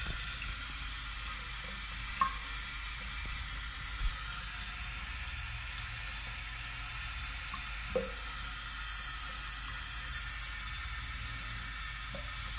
Copper Pipe Leak Under Ground Heard With Pipe Probe